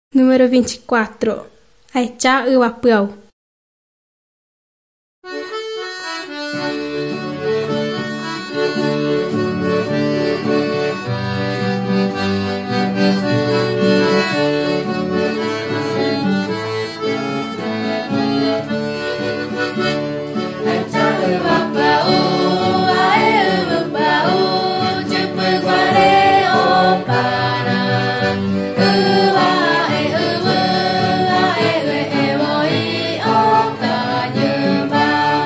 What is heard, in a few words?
songs guarani mbya; bible